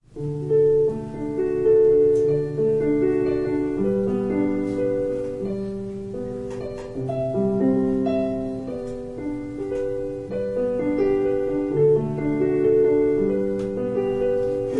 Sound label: Piano prelude